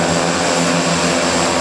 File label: Aircrafts Engines PT6A-25C Around Engine; DCS